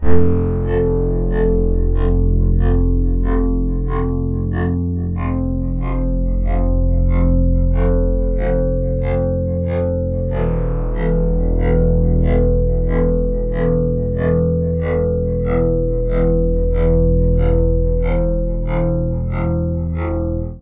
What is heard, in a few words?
Synth Poly